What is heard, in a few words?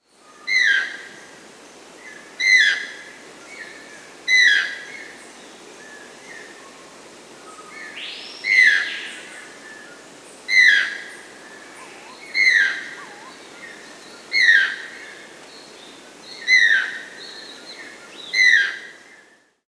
Channel-billed Toucan (Ramphastos vitellinus), Peru; S. America